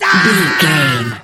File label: voice male death high